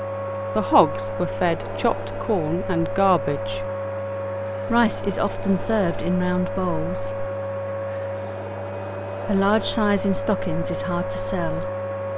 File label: Vacuum cleaner original conditions